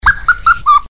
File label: hihaha